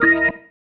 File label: Stab One Shots, Organ